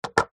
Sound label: foley massage roller ball set down on wood table